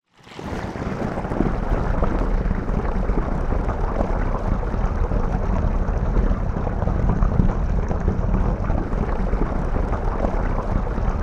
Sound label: household bath water pouring into bath recorded underwater tap on pour off